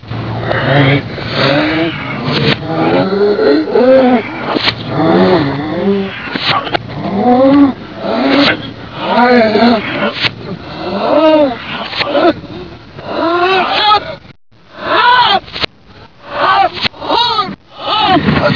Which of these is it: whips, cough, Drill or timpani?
whips